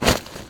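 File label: inventory slot, interface; Enhanced Graphical User Interface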